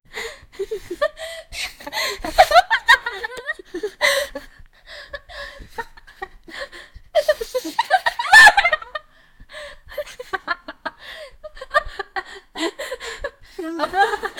white teen girls chuckling

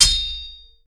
a rich and powerful sound, deflection

